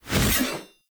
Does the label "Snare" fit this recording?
No